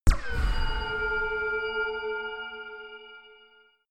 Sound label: chiming laser shot; soundpool